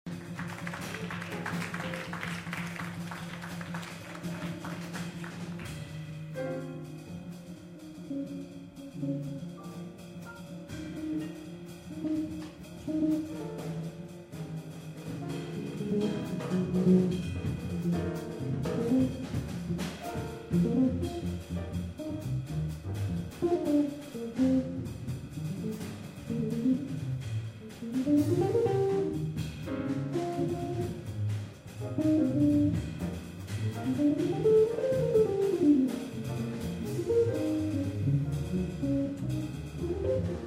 guitar neck

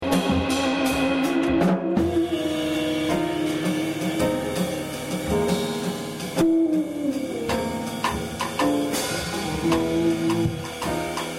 guitar bridge

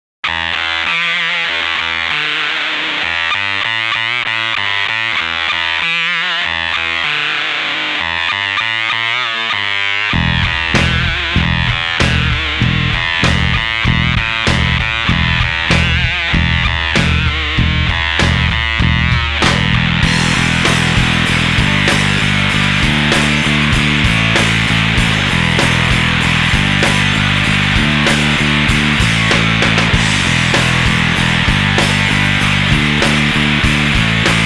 FLAN FUZZ

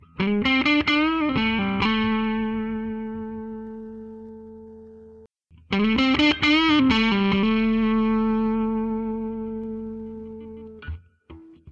lead neck

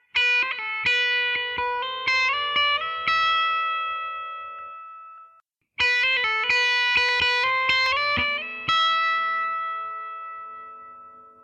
lead bridge

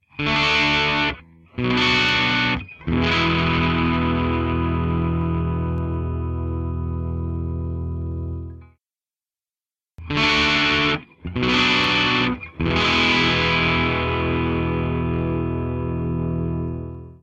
chords gain bridge

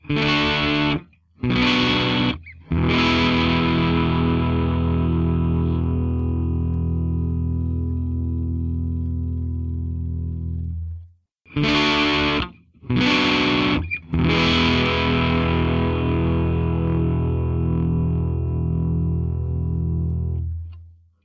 chords gain neck bridge

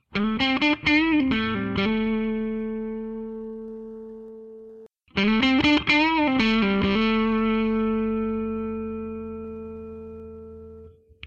lead neck bridge

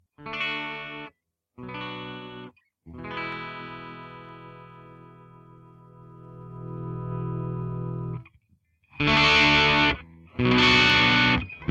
chords both bridge, Chords Clean

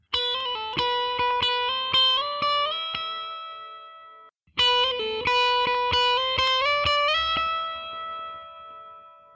lead neck bridge

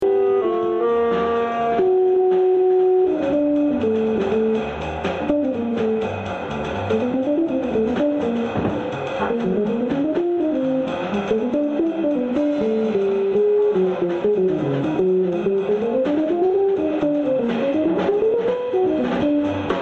guitar bridge

